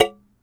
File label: Small Can - Flick Tab; Micro Pack